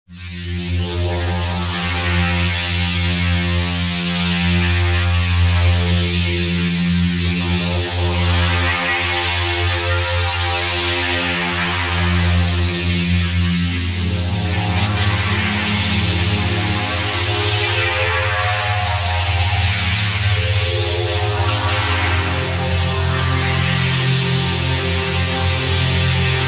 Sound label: Pad, goa trance preset; PD Pad Boys; JLS Pulsaris; vital preset pack